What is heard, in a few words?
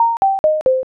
score down